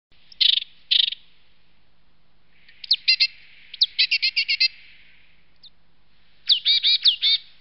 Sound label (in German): kohlmeise